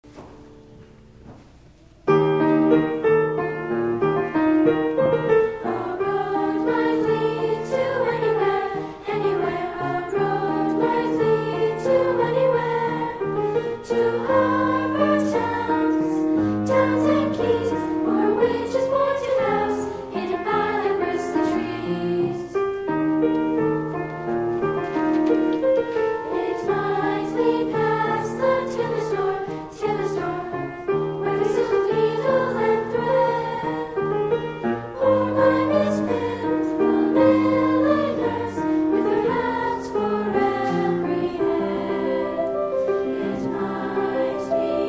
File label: Treble Choir